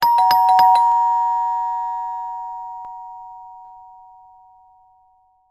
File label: household door bell ding dong impatient x3